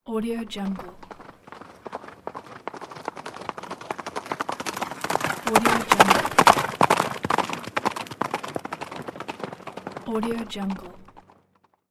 horse galloping

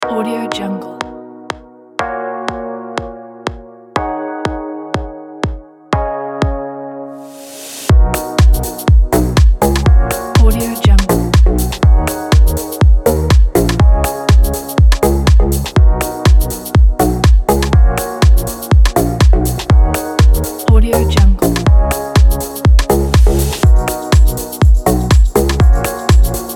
deep house

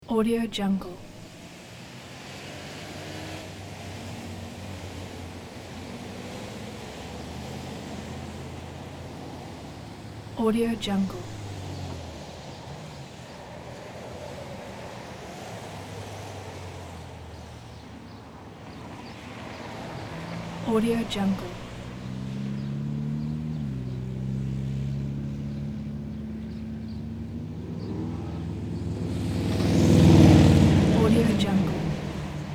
ambience road little traffic

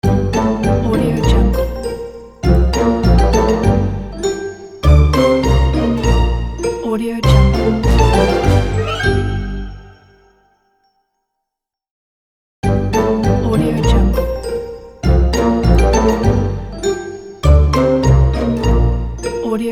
cartoon joke kids logo

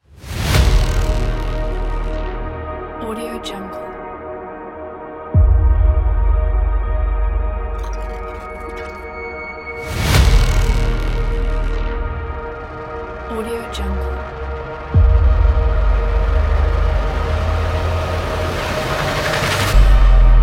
retro 80s cyberpunk trailer

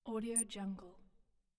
button click; moonaudioproduction

